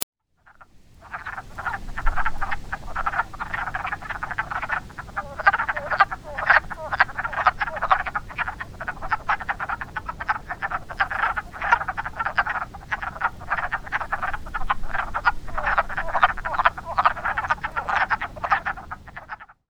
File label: wood frog